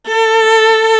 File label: SOLO VIOLA; East West; Global Sounds